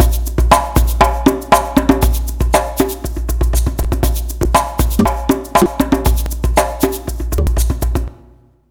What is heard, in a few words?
Modern Percussion Loops, MPC60 119